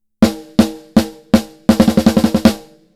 Snare; Oxygen

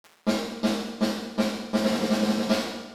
LF CUT 500Hz; XenoVerb

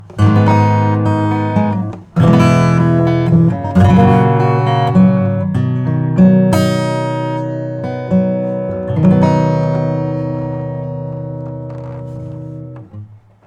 acoustic EQ LOW MID boost; Joe Chiccarelli

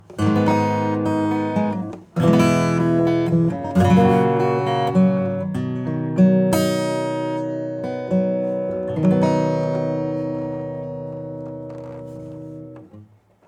acoustic Acoustic Body; Spectre

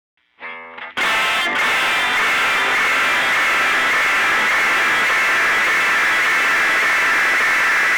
tube delay wet time right feedback long treble

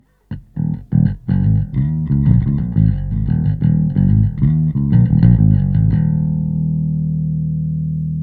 bass; Analog Pro

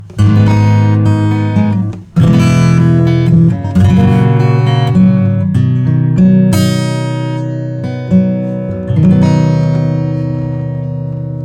acoustic POWER19.5 OPTICAL FAST 46.3; Joe Chiccarelli

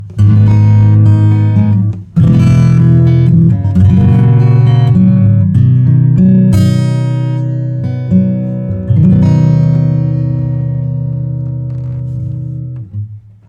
acoustic EQ LOW boost; Joe Chiccarelli

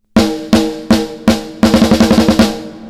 Snare

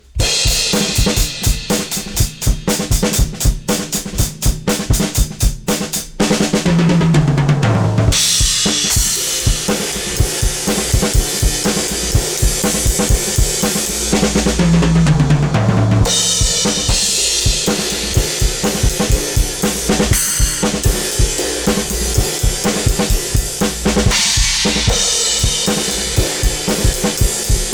drums Heavy Rock; Joe Chiccarelli

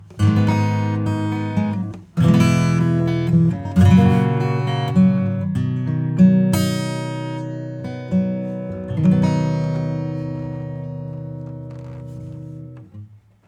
Plate Acoustic; UVI

